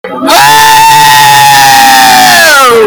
wooahhhhhhhhhh

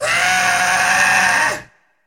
goat aaa; goat simulator

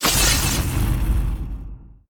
grave robber flashing dagger miss

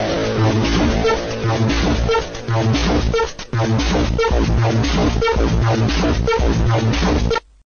on sight music

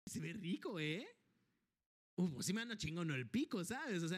peak heart

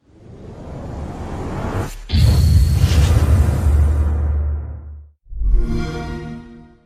xbox series startup

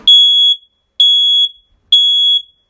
smoke alarm

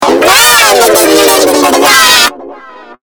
loud scream

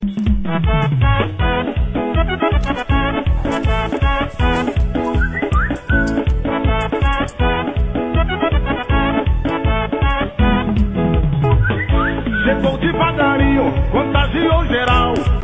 pigeon music

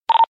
radio click

